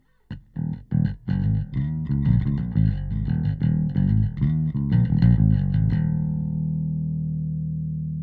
bass bypass; SplitEQ

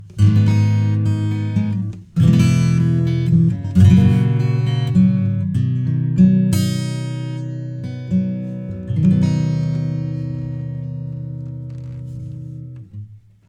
acoustic EQ LOW MID cut; Joe Chiccarelli

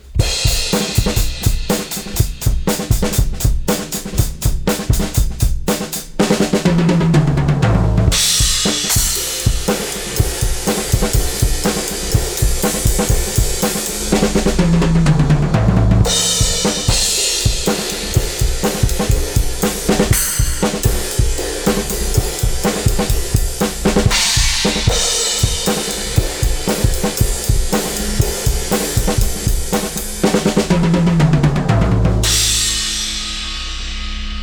drums; Blend it all together

